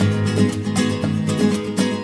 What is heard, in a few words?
guitar, Jazzmatazz Loops